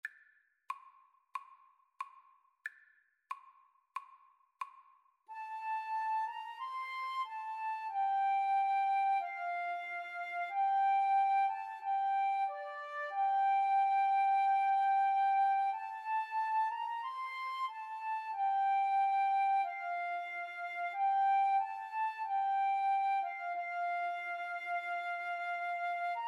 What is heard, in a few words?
Flute duet